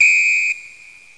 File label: whistle